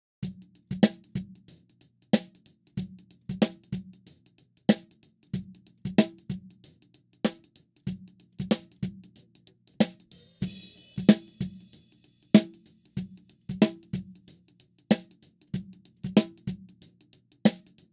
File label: bip